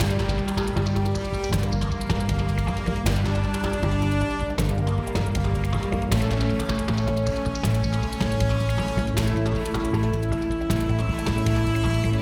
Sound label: Tornado Platforming MIXED; Ben 10; switch gamerip